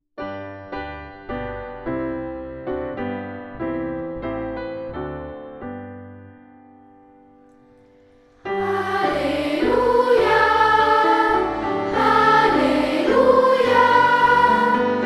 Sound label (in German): Halleluja